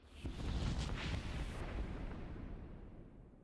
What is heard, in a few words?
spooky ambient sound